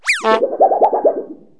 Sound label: zip horn, cartoon accents